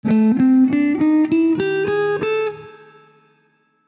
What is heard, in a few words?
Canarywood wooden pick; SixStringers